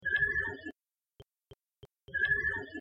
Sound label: oriole call